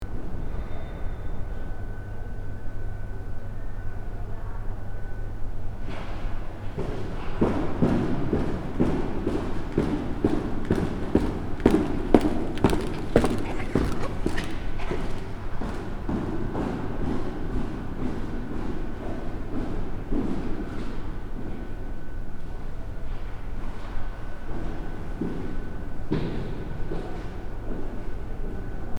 Large hallway constant humming and ventilation loop